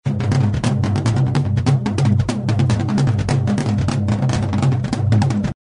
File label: tribal drum rhythm fast